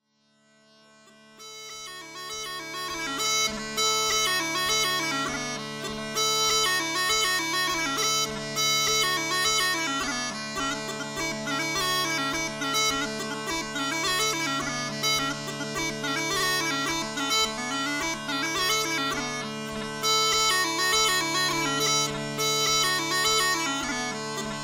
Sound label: smallpipes cane reed; Laburnum combination smallpipes with A chanter , drones tuned to A-d-a.